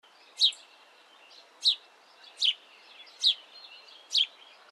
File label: sparrow house